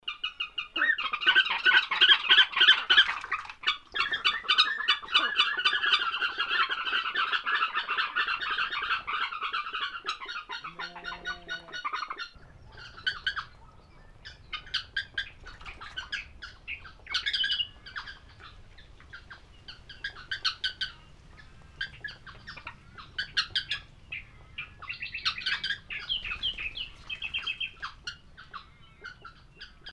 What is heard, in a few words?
Australian Spotted Crake